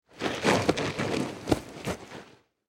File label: Foley Human Crawl Dirt Movement; Miscellaneous Human